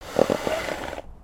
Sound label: horse idle; creatures organic